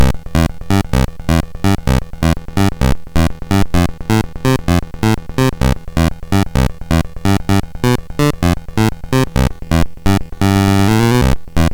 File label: Bass; Bass Demo